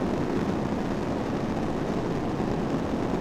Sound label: Gas Burning; hidden deep